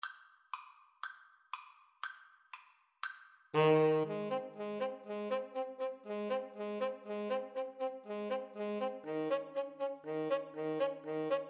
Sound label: 2 sax